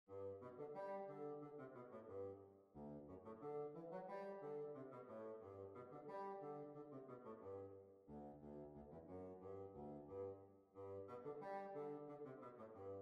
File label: Hornpipe; Portobellow Hornpipe